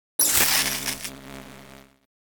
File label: Electric Arc Short Circuit Electric Shock